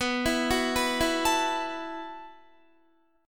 B 7th Suspended 4th B down Guitar; B7sus4; arpeggiated; 4E,4A,5D,5G,5C,6F

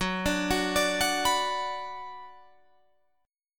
Gb Suspended 4th Sharp 5th, Guitar, Drop D

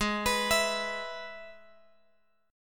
Ab Minor Sharp 5th, down

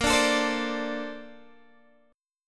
A sharp Major 9th, Guitar, DADGAD

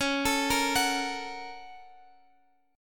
A sharp Minor Major 7th Sharp 5th

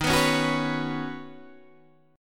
Eb Minor 7th Sharp 5th, Guitar DADGAD

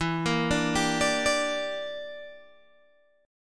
E Minor 7th Flat 5th E, Guitar Open G

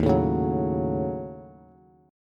Eb Minor 7th Flat 5th, Bass; strummed

